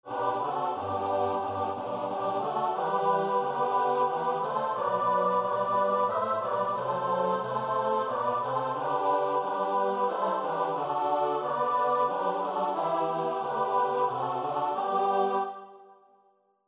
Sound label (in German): Lied chor; Lied der Pariser Kommune; Lustig lustig